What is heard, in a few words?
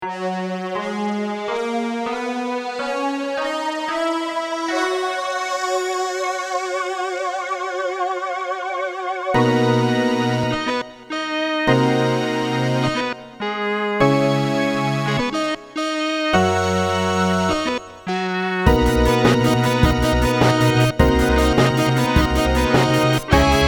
Chiptune